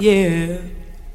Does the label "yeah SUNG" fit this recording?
Yes